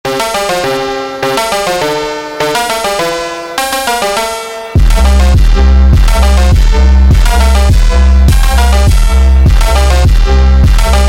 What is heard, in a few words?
Christmas Phonk House